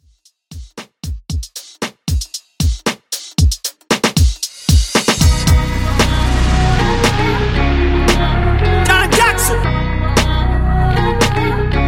115Bpm Clean

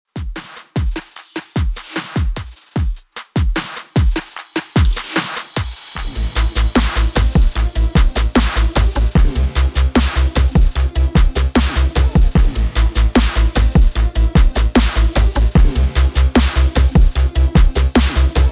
dubstep, 75 bpm

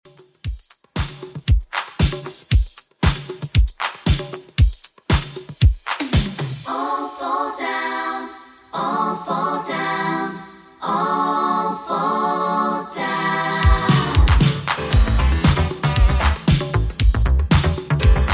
90s Redrum 116bpm

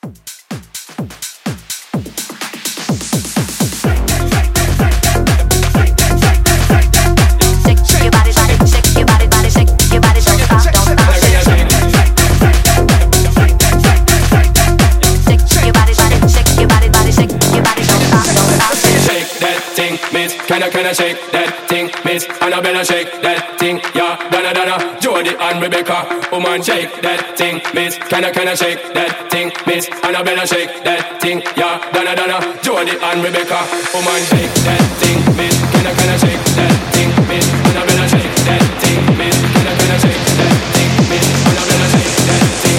Club Hype 126 CLEAN

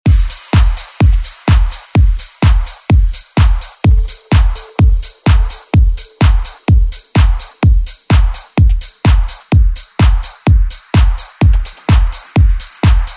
Dance Ext Redrum

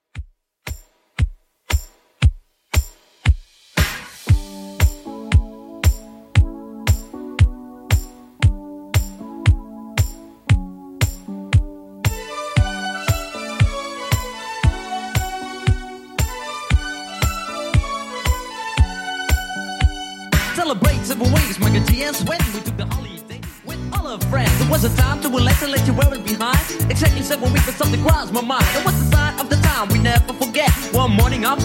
80s Redrum 116bpm

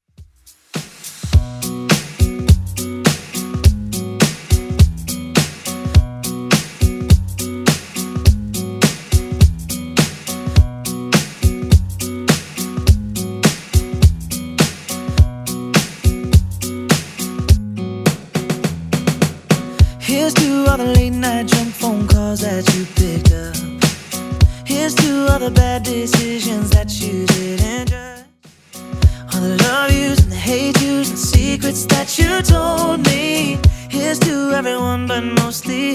Top40 Simple Redrum 104bpm

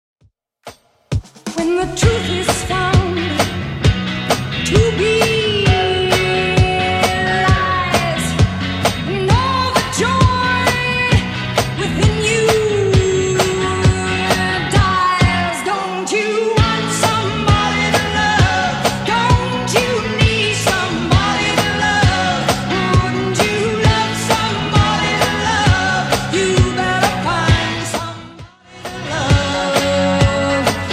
70s Redrum 132bpm

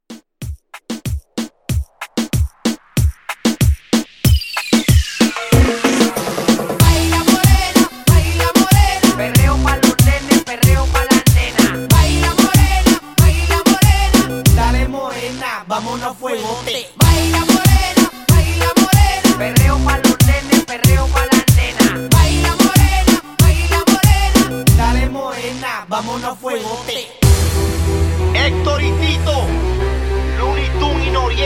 Latin Reggaeton Club 94

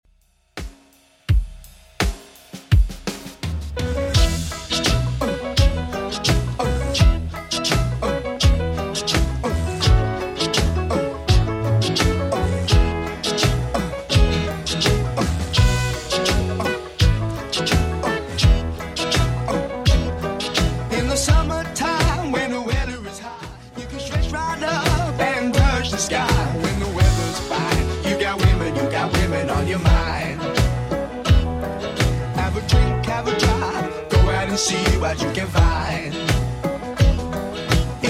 70s Redrum 84bpm

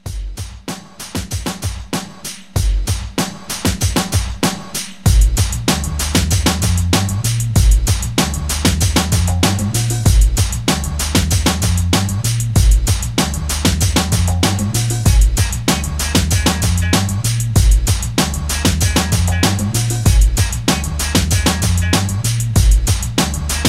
80s Pop Hiphop Re Drum 96

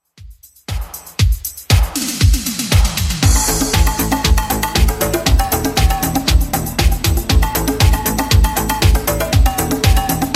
80s Redrum 118bpm

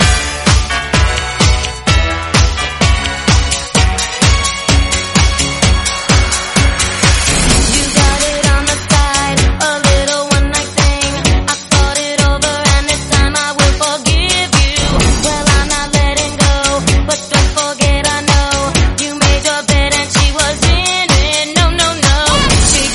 Snippet 128bpm Fm

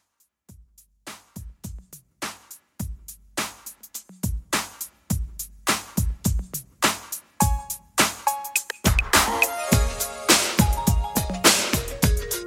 90s R B Redrum 104Bpm

